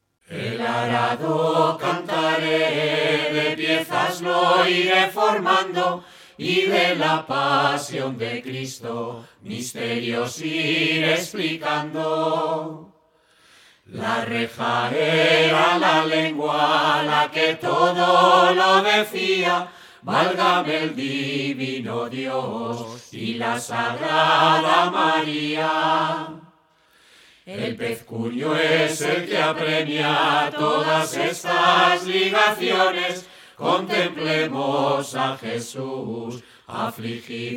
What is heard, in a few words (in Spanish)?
canto de pasion, el arado